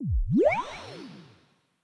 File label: Gacha Result SSR; blue archive